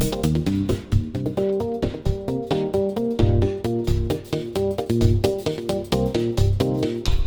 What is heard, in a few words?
Funky 125 Cm7; Loops